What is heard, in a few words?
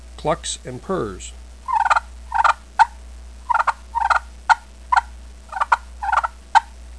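dueling clucks purrs, turkey call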